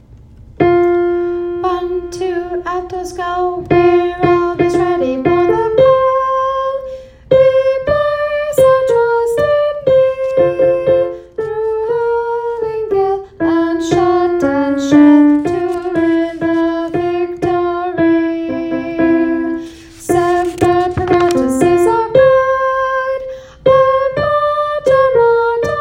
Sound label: Coast guard alto, military songs